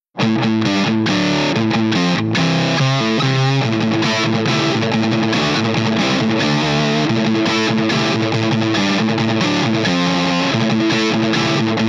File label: Hard Rock